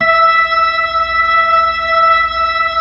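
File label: B-3, Hammond B-3 organ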